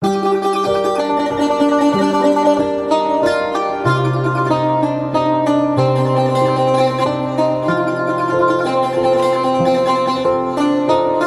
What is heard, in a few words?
pisano sad ringtone